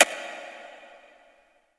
VEE Percussion; Percussion Instruments

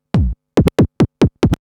Scratch Fills 140BPM; Vengeance - Essential Clubsounds Vol.4

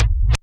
REV KICK

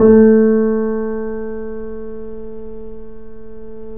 A, The Piano; InVision Interactive - The Piano; BOESINGSOFT

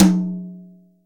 TOM, CTD TOM BRSH

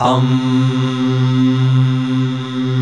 UMM C3, POP CH2

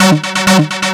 Techno Synth

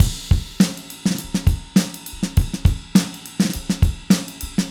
Acoustic Kit, Drums & Percussion

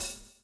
swing

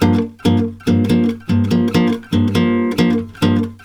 G MIN, Brazil Bossa; USB Soundscan; AKAI

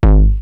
Roland TR-909 BD; BD Selection

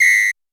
Roland U220 Electric Set, Drum Machines

